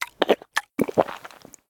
Zombie Eat

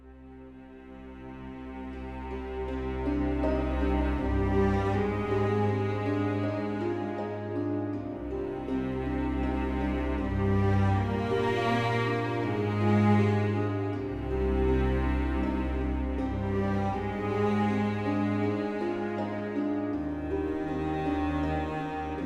Music Login; A Year of Rain

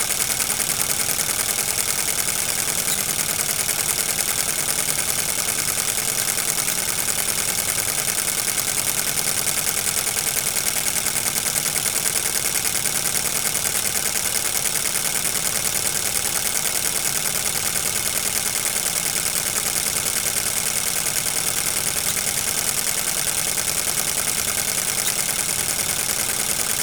Idle Ambient; Showman

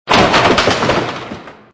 Smash Plastic; Extra Lives

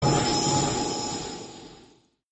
curse sound; Arc of Templar

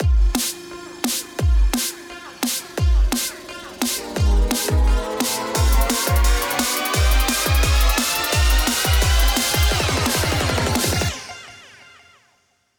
Intro Dnb; Cyber Hook

